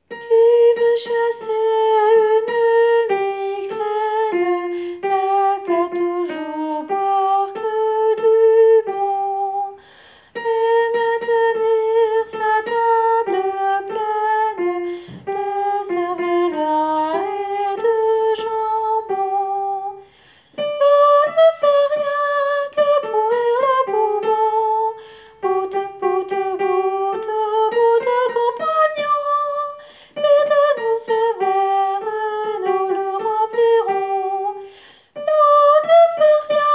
migraine tenor; Qui veut chasser une migraine

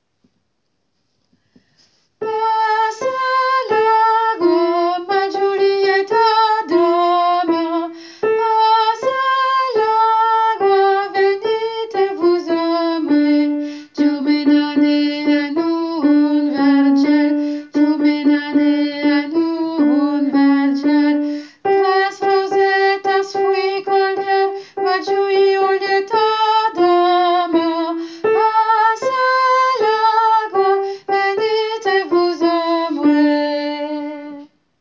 soprano; pase el agoa

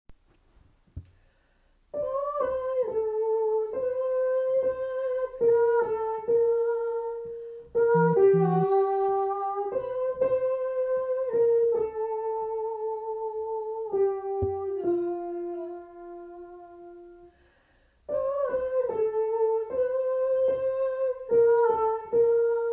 el cant tenor

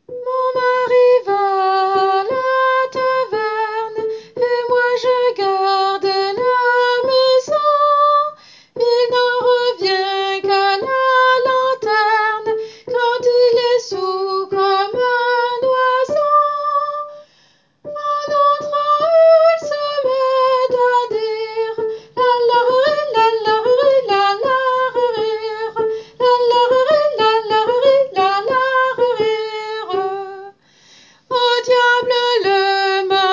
soprano; mon mary va à la taverne

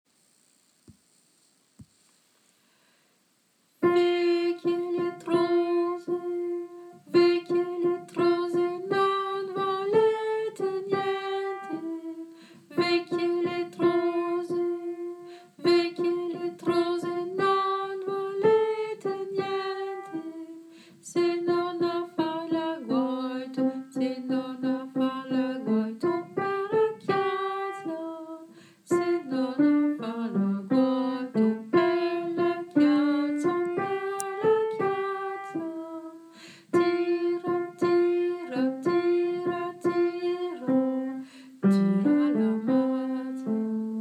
vecchie alto; vecchie letrose

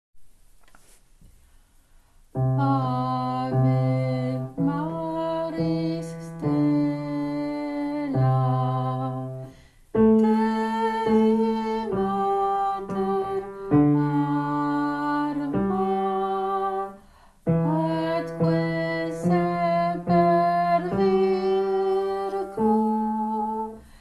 Bas; Berthier

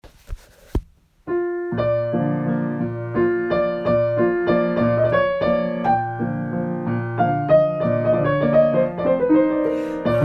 Libiamo Soprano Tenor; Brindisi (Verdi)

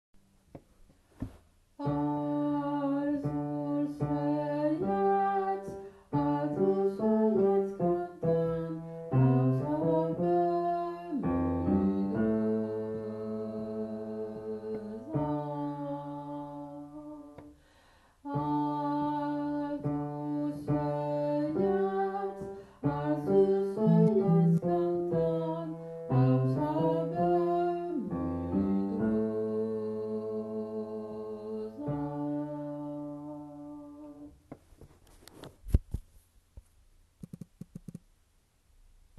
el cant basse

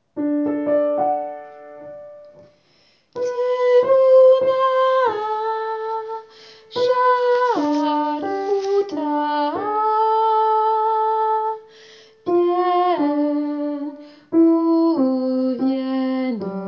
alto; Temuna

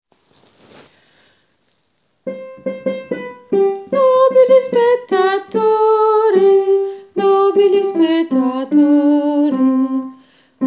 contrapunto alto

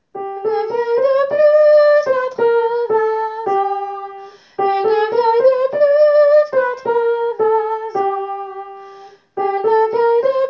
vieille tenor

